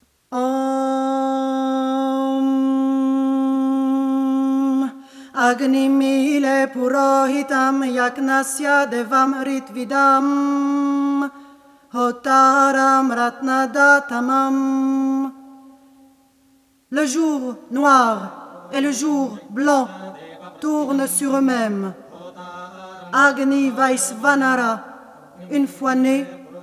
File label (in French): Choeur; Agnim ile - jour noir; venus vagabonde